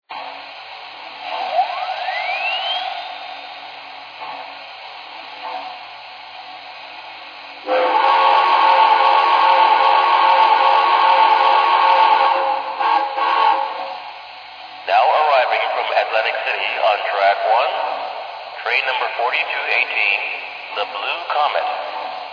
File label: p47